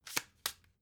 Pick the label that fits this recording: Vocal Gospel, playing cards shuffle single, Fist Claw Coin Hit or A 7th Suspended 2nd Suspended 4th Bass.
playing cards shuffle single